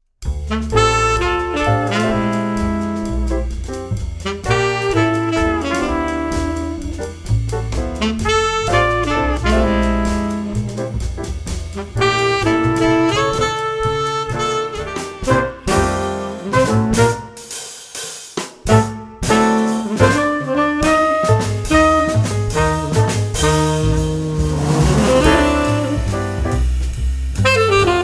Jazz Collection - Cool Jazz

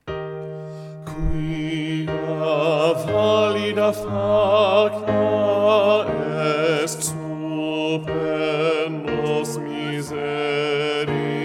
Largo Bass; Laudate Jehovam 2 Largo

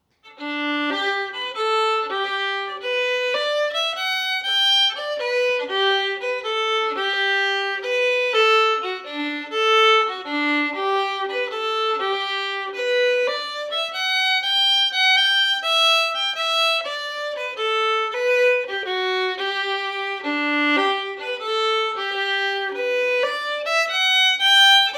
Saddle the Pony, Slower

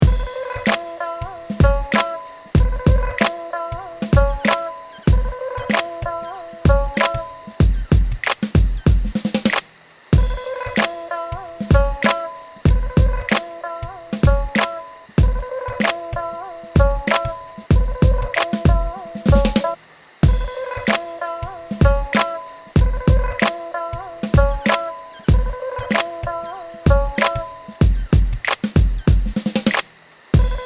Home Work Beat

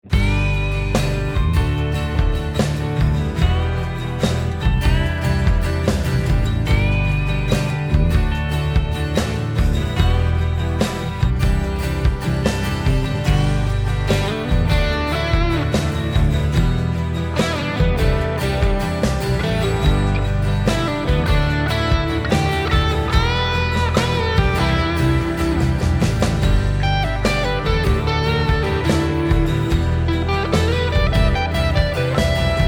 Country Ballad

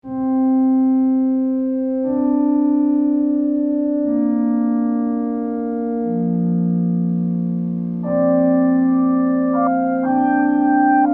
Spring

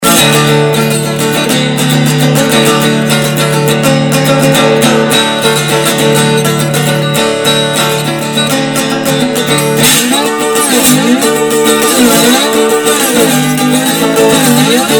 Resonator

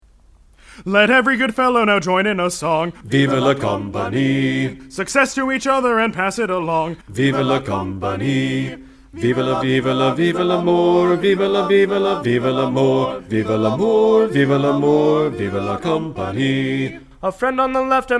Bass loud

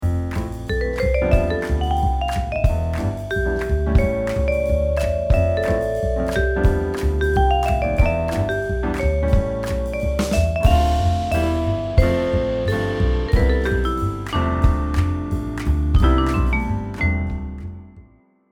Jazz Background

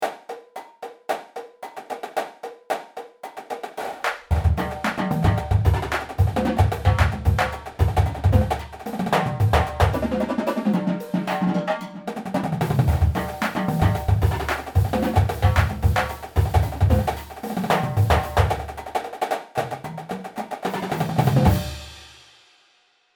Stick Control

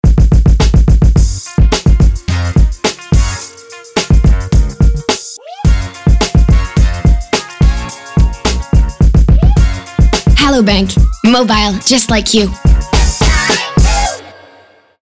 jingle; Hello Bank

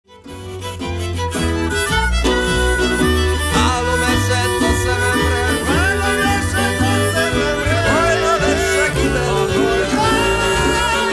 Transdanubian blues; Dunántúli blues

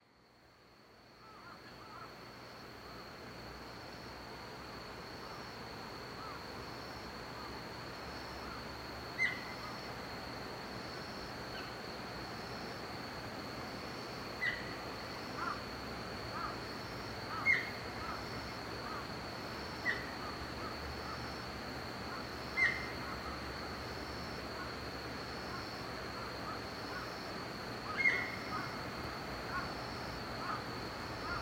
deer

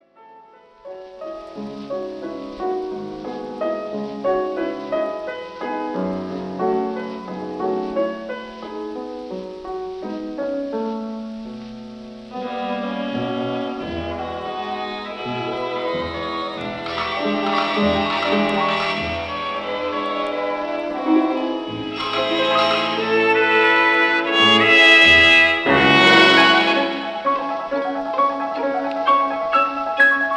Piano Orchestre; Ravel

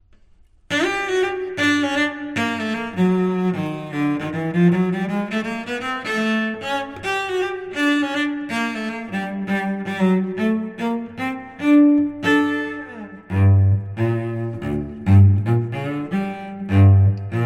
gypsy cello

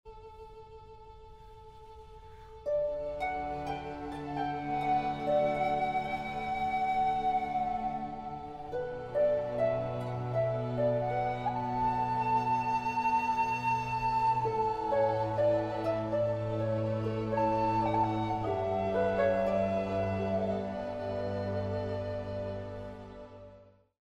Flute and Orchestra

